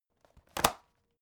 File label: folder close